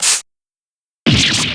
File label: damage